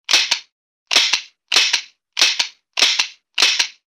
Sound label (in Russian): zvuk perezariadki pistoleta